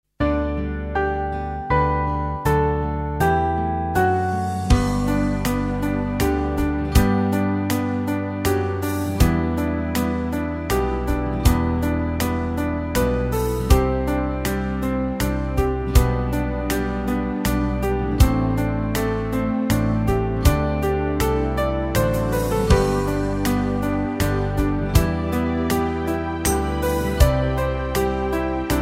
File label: Reveille, gitaarstarter; Meespeel CD